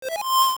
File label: Switch Hook Switch; Switch Hook switching Link's place; Zelda Oracle